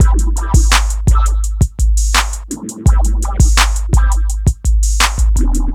Loop

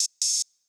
Open Hat

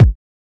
Kicks

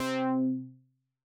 Bass

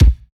kick 80s; Kicks

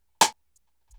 RIM

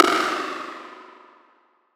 Perc

